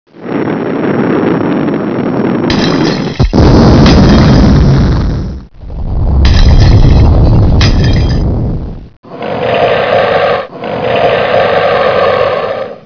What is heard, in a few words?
monster